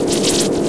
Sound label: Spin; Flare Dancer; OOT